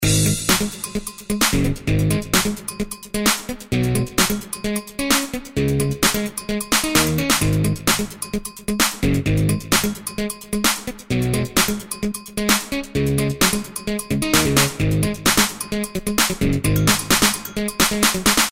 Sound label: Rock Dance rhytm; Sound Graph